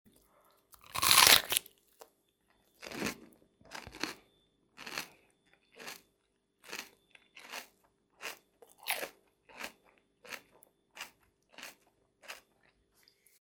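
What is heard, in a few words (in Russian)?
хруст капусты цельной; Звуки откусывания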